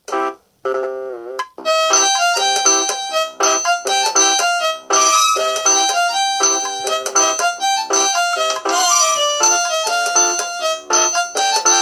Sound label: nokia arabic